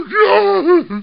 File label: zombie voice idle